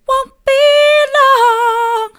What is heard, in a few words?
wont be long mid, vocal